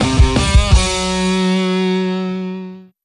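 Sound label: Mission Failed! Jingle; the simpsons hit run; ps2; Lightning-Fast Wit - Jingle 2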